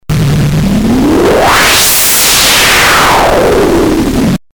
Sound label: polivoks fx